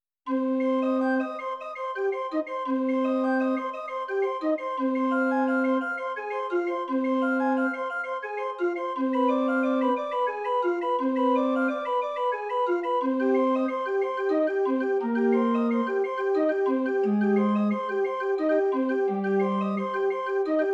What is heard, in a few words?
Prelude in c minor; 2007; Prib; Bach